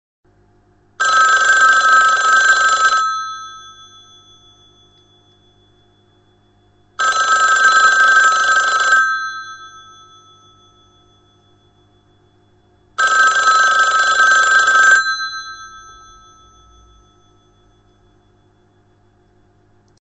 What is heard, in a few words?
US Bell phone sound